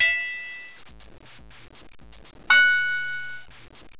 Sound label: piano